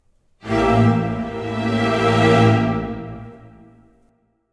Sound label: Music Battle